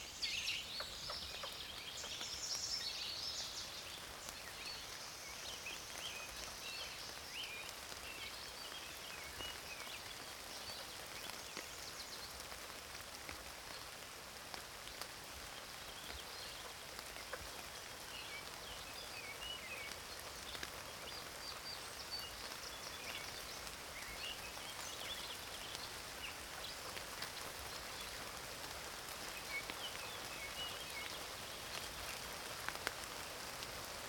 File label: Wilderness Rainshower; Nature Sounds; Sounds of Nature Collection